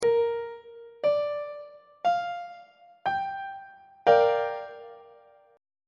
a double flat minor 7th 1st inversion; treble clef

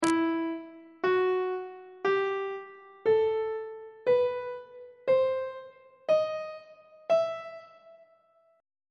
e harmonic minor key signature on treble clef

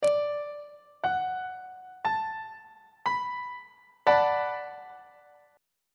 b minor 7th 1st inversion on treble clef

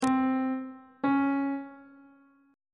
d double flat 1st interval pitches; treble clef

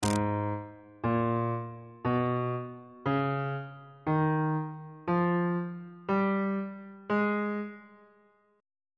g sharp melodic minor scale

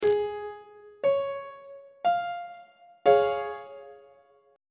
d flat major 2nd inversion on treble clef

